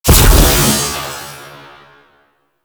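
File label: Alt Projectile Explosion, Weapons; BE Tetra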